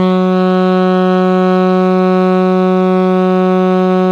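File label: ALTO pianissimo F#2, Sax; Giga Samples Collection; ALTO SAX PP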